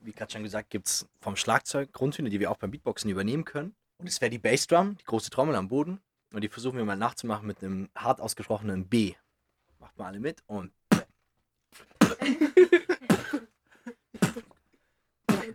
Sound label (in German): Beatbox - Das B, die Bass Drum